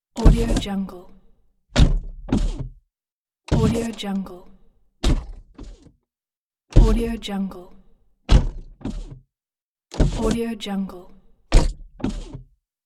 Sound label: car door open and close pack